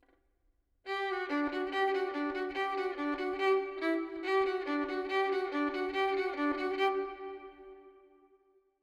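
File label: harmonium 3-4 70 verb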